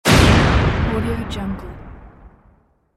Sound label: fire explosion